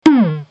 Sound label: tam D, techno drum; custom kit